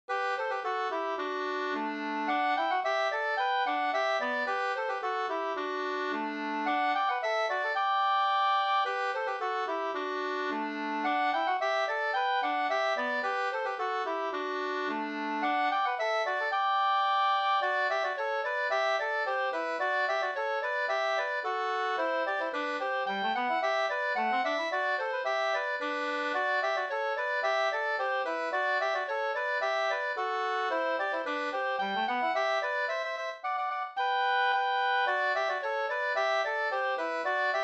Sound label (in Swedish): folkdans, Pariserpolka